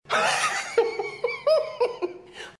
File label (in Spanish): risa guason